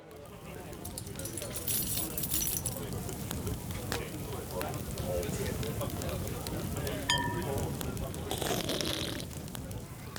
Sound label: Crowd Ambience; Pitch Black Audio Pong